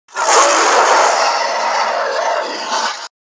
bear sound

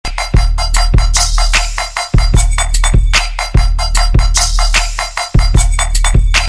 beat

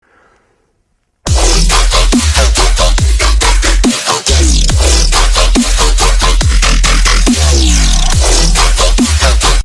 dubstep

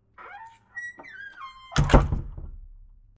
closing squeaky door

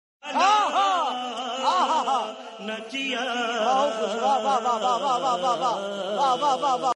wah wah wah

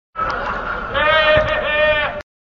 awkward laugh; voldemort

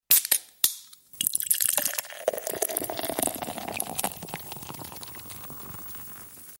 beer pour

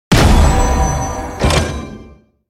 shoot; pixel gun 3d; dream warden

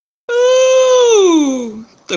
uuuuul

